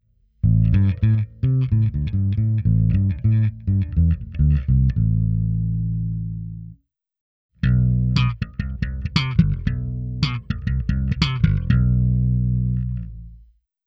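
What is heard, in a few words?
bass di clean, behringer vtone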